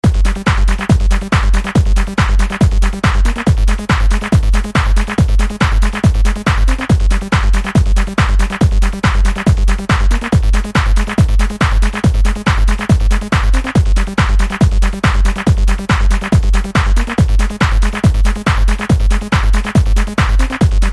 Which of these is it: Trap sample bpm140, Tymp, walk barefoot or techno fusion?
techno fusion